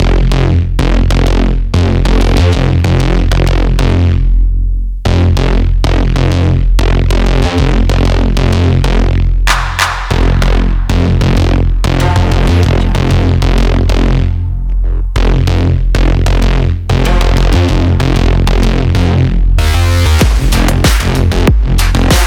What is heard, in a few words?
aggressive industrial cyberpunk pack